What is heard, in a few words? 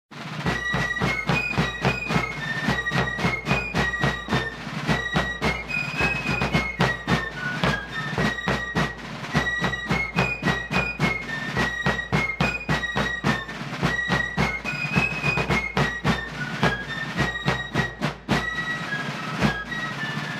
Drum & Bugle; Yankee Doodle